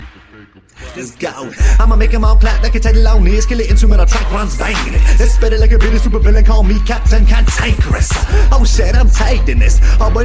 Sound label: Clap